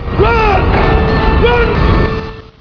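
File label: run 8 bit; sound effect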